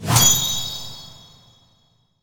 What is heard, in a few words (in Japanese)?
シャキーン！強烈なインパクトに最適!